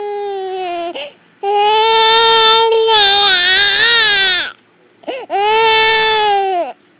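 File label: baby hungry, female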